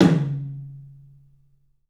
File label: TOM, 3D Drums, CLUB TOM; 3D Sound